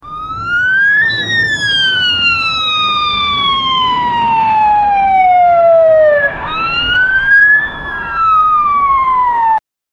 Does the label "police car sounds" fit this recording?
Yes